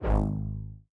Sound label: throw ball wave; CrossCode; battle